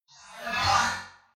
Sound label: Spaceship Light Sweep Transformer Space Scifi Robot Digital Whoosh; Tech; Sound Design; Tom Hutchings